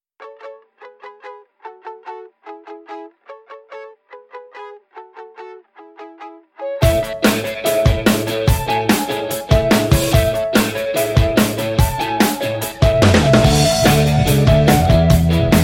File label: Happy Birthday Pop Rock Instrumental